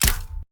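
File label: military crossbow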